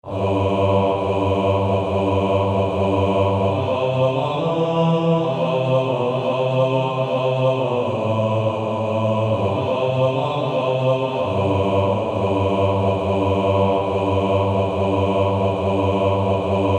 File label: Male Choir B2; Battle Hymn